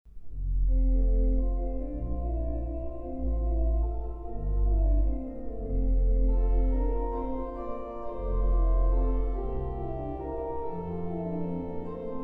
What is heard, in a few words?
Mendelssohn organ sonata no 4 in Bb Allegretto